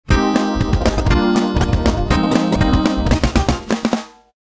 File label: Pop